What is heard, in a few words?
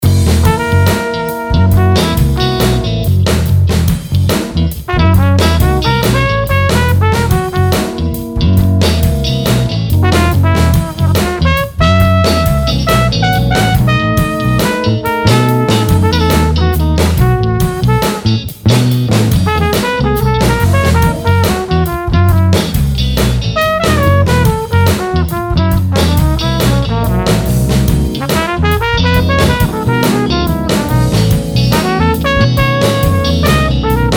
Funk In F Sharp 70 Solo Flugle Horn; Blues Solos